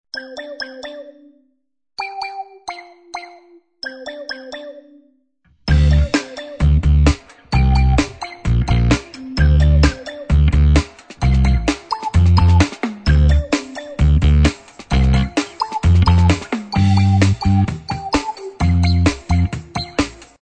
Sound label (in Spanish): Tono; Telecom Personal